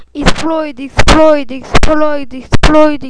explode

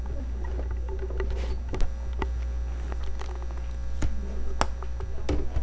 rumble

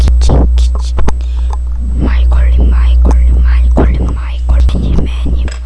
microwave

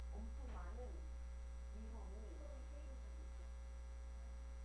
hammer

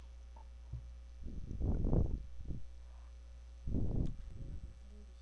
throw

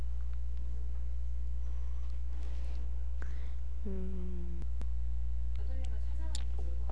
deer

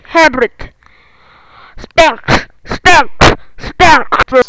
spank

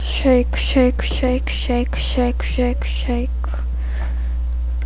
shake

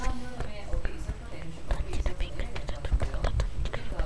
throw

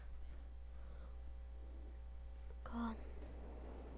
gun

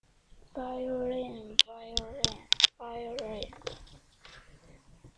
violin

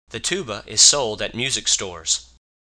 tuba

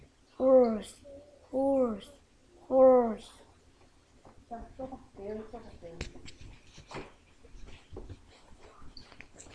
horse

